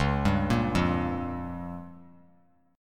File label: C sharp 6th Flat 5th C sharp down; arpeggiated; C#6b5; JGuitar